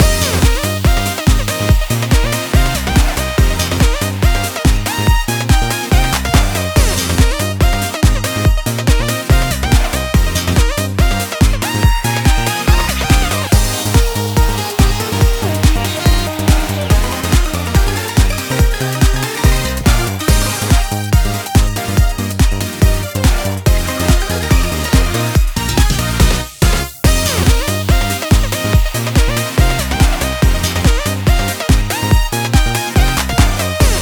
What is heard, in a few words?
BGM RESULT CLEAR